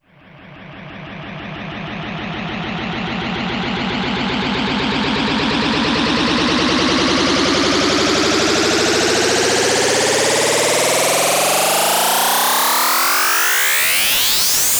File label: Sweeps Long